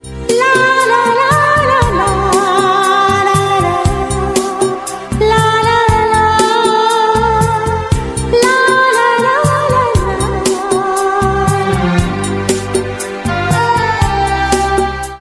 lyrical female sweet voice

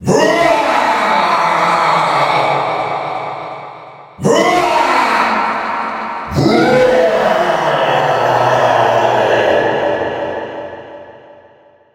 halloween scream

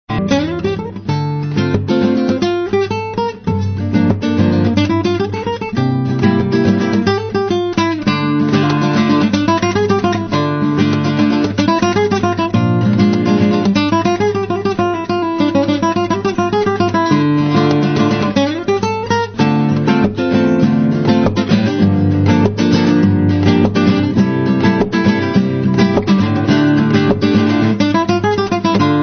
Perfect Guitar; Latin

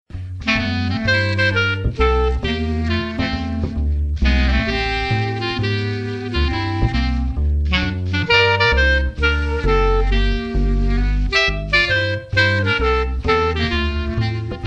Jazz Version

